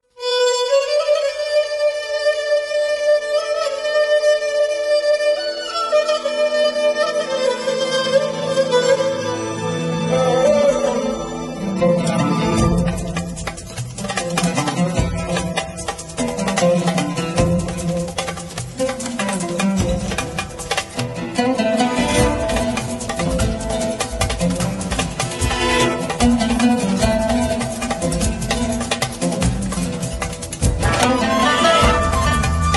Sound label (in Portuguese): ciganos instrumental